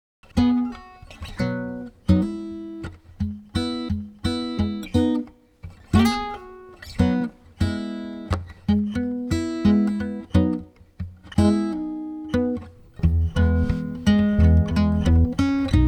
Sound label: guitar bass